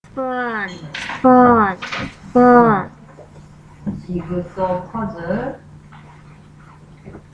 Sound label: spoon